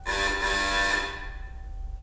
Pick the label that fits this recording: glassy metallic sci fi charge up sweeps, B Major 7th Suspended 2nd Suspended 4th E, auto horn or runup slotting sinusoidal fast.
auto horn